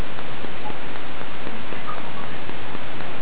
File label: living whistle